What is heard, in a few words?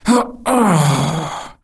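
afflicted female death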